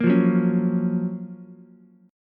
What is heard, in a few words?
Gb Major 7th Gb Ukulele; GbM7 strummed; JGuitar